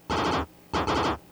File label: Fire